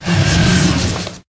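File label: Dragon Skeleton buff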